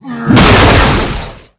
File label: Battle Paralysis; Lab; Gamigo